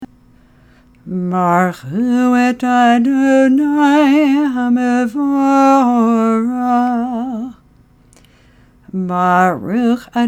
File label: Friday night Barchu